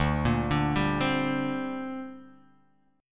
D 7th Suspended 4th Sharp 5th, down

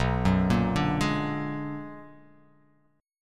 B Minor Major 13th, chord; arpeggiated; JGuitar

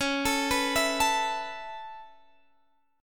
Db Minor 7th Sharp 5th Db down Guitar Standard; arpeggiated; JGuitar

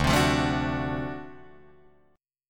D 7th Sharp 9th D down Guitar Drop D

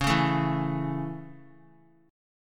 C sharp Minor Sharp 5th down Guitar; C#m#5 strummed; JGuitar

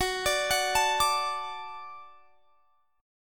Gb Minor Sharp 5th; Gbm#5; arpeggiated; x,21,24,23,22,22

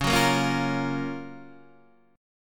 Gb Major 7th Db, guitar

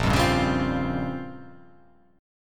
A sharp Minor 6th Add 9th A sharp down, chord

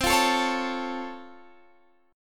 C sharp Augmented Major 7th, C

